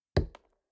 lumber tree

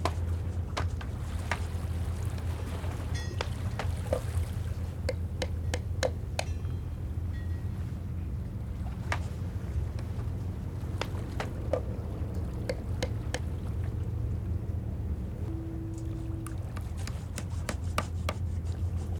port, ambient

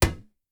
sling metal, attack impact

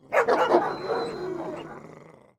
Kennel, completion; 0AD

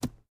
arrow dirt, attack impact

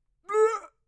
male death

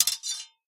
sword hit

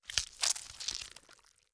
gather meat

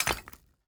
mine stone

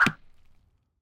arrow wood, attack impact

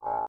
camel; fauna

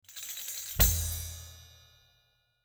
alarm create priest; interface

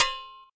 shield metal, attack impact

